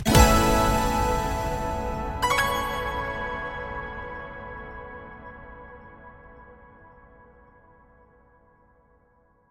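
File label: synthwave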